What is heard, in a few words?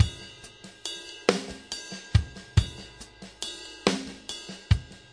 six eight Loop, Six-Eight Loops 100 to 105 bpm